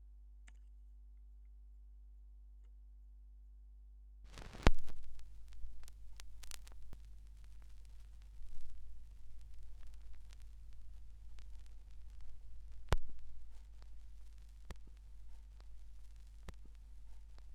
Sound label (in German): schallplattengeraeusche